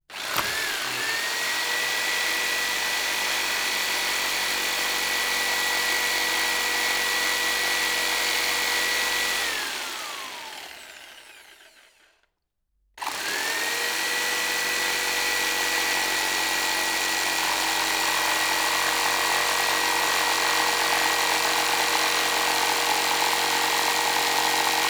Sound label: Electric Saw Through Wood; Nuts And Bolts